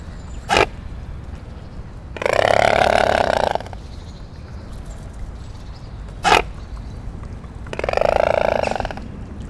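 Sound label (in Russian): lebed shipun golos, mute swan voice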